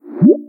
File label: whump